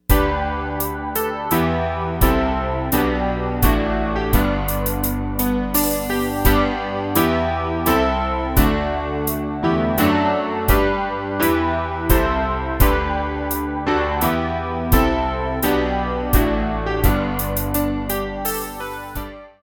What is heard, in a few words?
Patriotic Medley Americana Strings